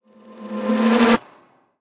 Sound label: Tiny Riser